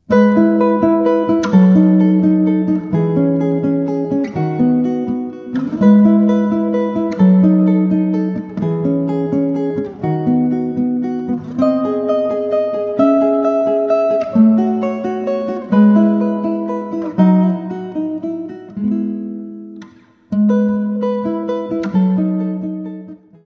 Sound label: Minuet